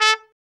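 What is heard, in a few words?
BRA TRUMP, trumpet, brass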